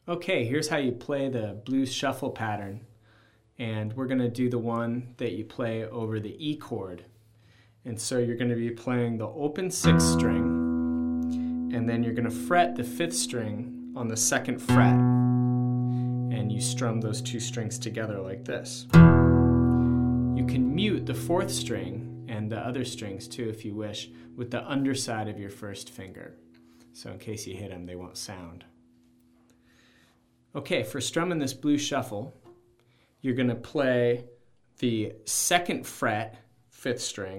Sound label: Shuffle Breakdown